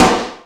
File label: Mega Drums, Drum Machines